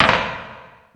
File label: Tool Kit, Drum Machines